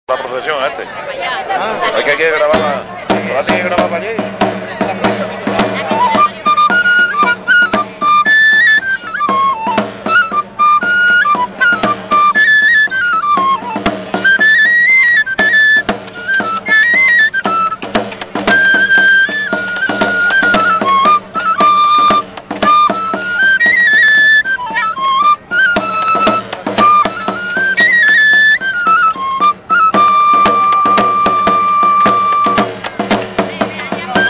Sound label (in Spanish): procesion